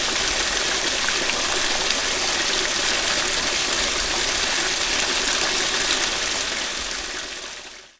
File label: stream IR